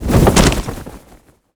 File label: parachute land